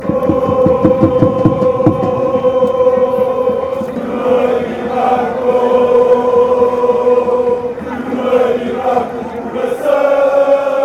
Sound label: i wasnt born spanish fan chants; portugal; portuguese liga